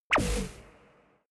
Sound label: space laser gun bubble shot forceful; krieg-waffen; soundpool